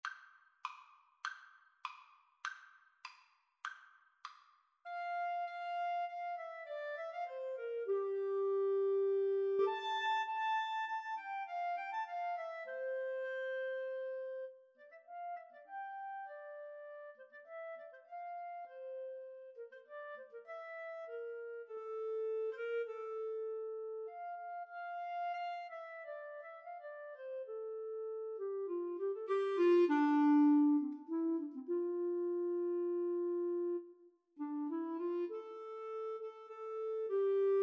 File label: Clarinet Duet